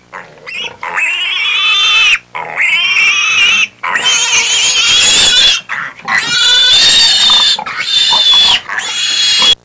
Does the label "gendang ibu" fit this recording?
No